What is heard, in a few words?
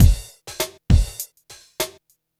drloop, drumloops, Vinyl Attack; Masterbits